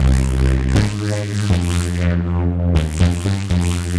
Mod B, 120 bpm, C, modular; modular madness

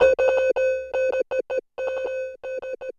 SYN LP, 160 BPM, Drum & Bass; Ueberschall; Drum & Bass Flash Trackz

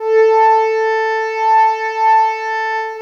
Dream Pad (single note)

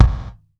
GATE, KICK DRUM; Sampleheads; New York City Drumworks VOL-1

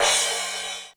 Crash, Korg DSS-1 Drums

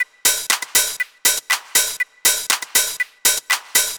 Combo Hat

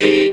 tuners

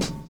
OLD SNARE; Univers Sons

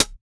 GLASS; Spectrasonics; Metamorphosis; GLASSBABY

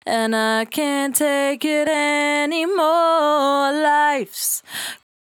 68bpm D, Velvet Vocal; Zero-G

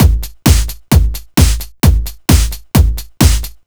131 Retro Disco Drums; Zero-G; Soundclash Nu Dance Electro; Acidized Wavs; 131bpm

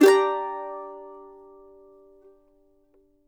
mando min; Sampleheads; Whole Lotta Country 1 & 2

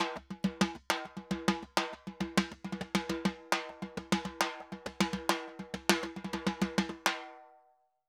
Timba, Festa Latina, Drums & Percussion; Best Service; Festa Latina VOL-1

